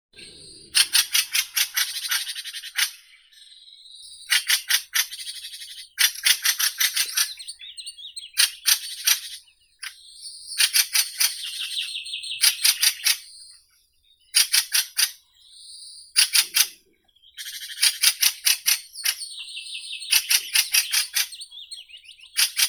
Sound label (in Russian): drozd ryabinnik turdus pilaris